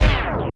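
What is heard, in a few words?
Drum Analog Kit Scratch, Yamaha RM1X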